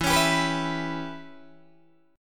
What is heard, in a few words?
E Minor 6th guitar chord, Open D